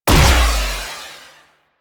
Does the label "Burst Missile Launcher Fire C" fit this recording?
Yes